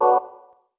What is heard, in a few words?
ui return; phone; notifications